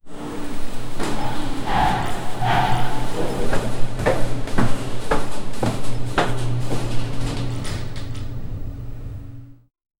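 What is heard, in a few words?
fast running footsteps, heavy breathing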